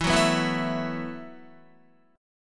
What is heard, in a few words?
E Suspended 2nd Suspended 4th E, Guitar, 4F,4A,5C,5G,5C,6E; strummed; chordshape; JGuitar